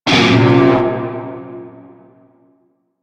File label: Shawzin Blues Chord; Warframe; Narmer Shawzin